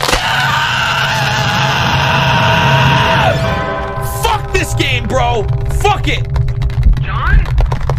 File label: ahhhh